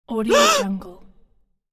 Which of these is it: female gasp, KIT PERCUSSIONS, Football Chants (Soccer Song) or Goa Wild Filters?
female gasp